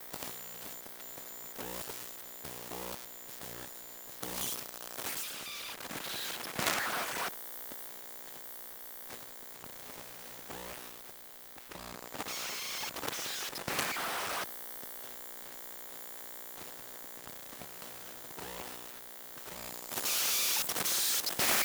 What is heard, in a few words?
Electromagnetic Macbook Sequence; Mono; Essentials Series NOX SOUND